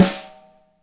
DRY SN; Spectrasonics; Burning Grooves; BG SNARES 4

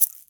Egg SK dwn, EMU Orbit-3 drum machine; 300 Drum Machines

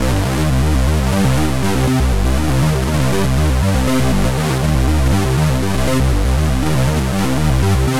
120 Cm bass, bass loops, trance

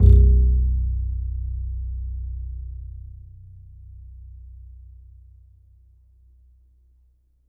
E, Upright Acoustic Bass; 1ST POSITION; Larry Seyer

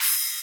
Rides, Cymbals

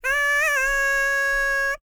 BULGARIAN, World Voices, BALKAN

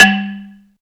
ZYLIMBA; Greytsounds VOL-1

